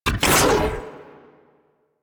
Arch Gun Alt Shot; Warframe; Archwing; Hildryn

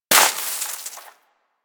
Bullet Impact Debris Snow

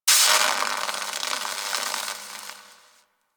Destruction Electricity Sparks; Ambience; Warframe; Corpus Railjack

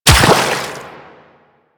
Run Water, Footsteps; Warframe; Quests; The New War; Kahl

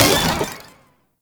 Spore Pistol Low Clip; Weapons

